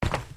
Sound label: land